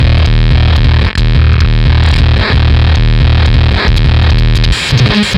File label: Fuzztest drum fuzzfactory